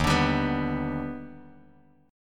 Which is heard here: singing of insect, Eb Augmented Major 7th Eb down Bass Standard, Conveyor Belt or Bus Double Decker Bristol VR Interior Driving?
Eb Augmented Major 7th Eb down Bass Standard